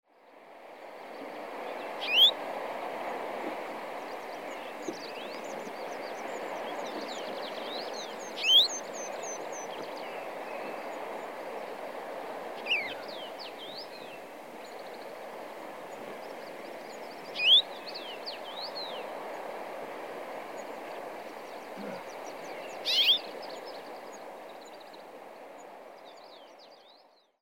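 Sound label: Common Rosefinch; akamashiko; baikal; koe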